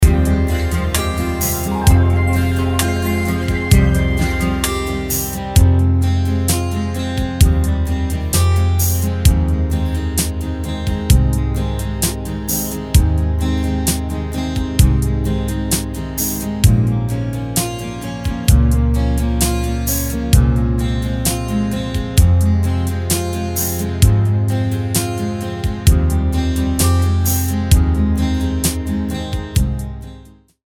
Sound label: Karaoke Song